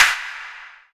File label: Long Clap; Zero-G; Soundclash